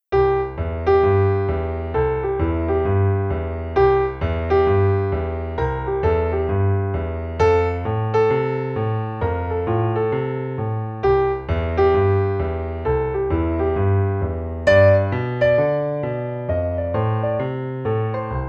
Daily boogie Piano seul

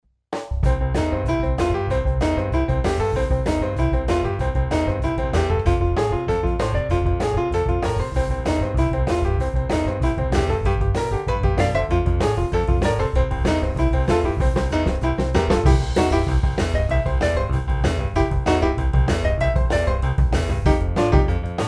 RiffRock boogie; Jazz

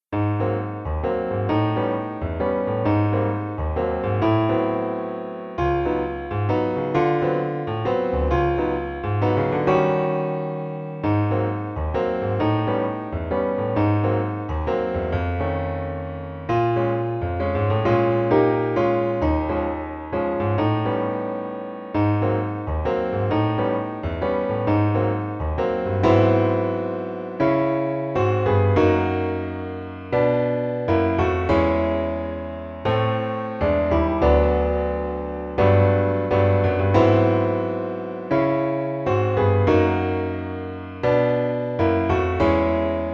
One note blues waltz, Piano seul; Jazz

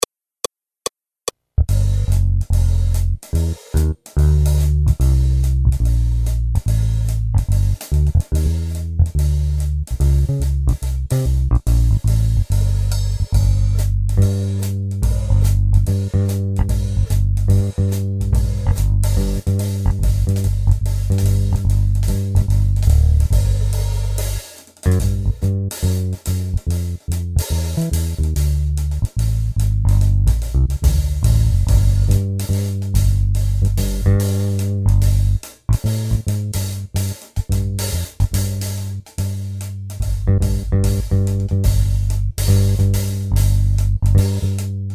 Accompagnement seul, piano; Jazz

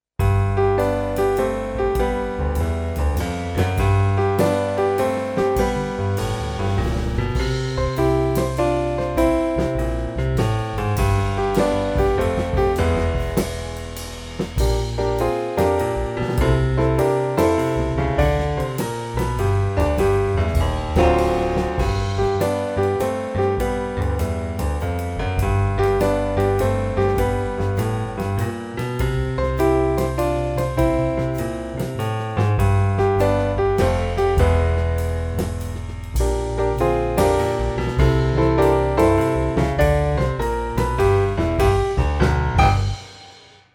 The Barber blues; Jazz

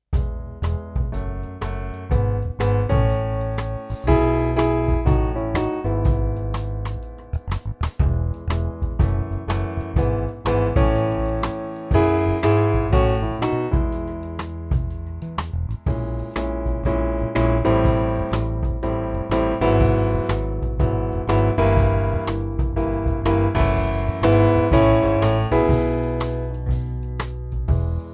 Classical swing; Jazz

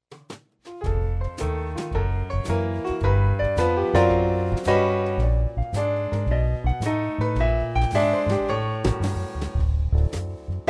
Chromatic blues; Jazz

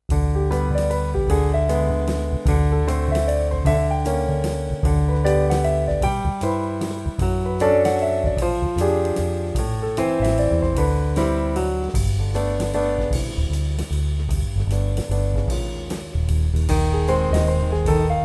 Two keys jazz waltz

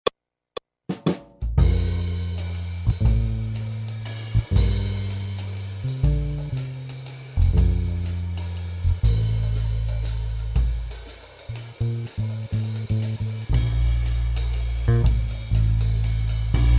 Jazz flavor waltz, Accompagnement seul

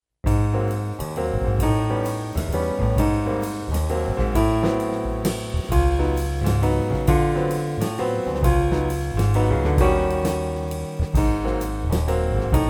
One note blues waltz, Jazz, piano

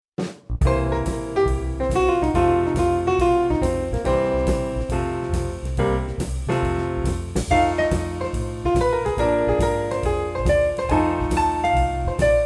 C & F blues; Jazz

